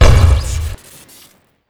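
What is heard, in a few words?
grenade launcher shoot crit; tf2; quake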